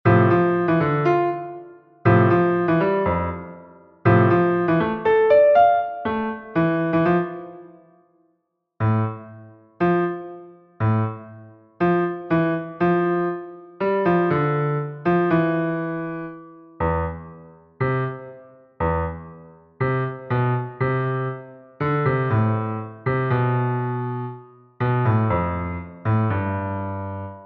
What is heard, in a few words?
2 melodies